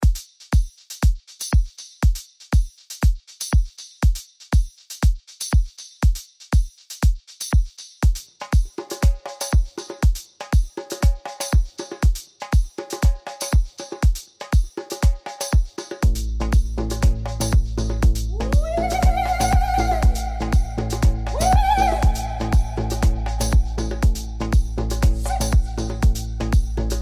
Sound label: Afrohouse